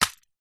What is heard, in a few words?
foley stones very small in tin set down ground; zapsplat